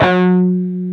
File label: PRS PWR G3D, Guitars; PRS PWR CUTS